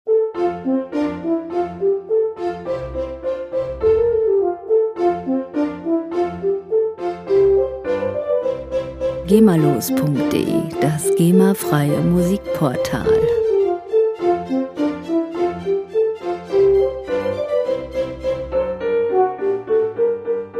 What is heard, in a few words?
horn, Hornsignal; klassische Orchestermusik; 3. Satz (Menuet) von Joseph Haydns Sinfonie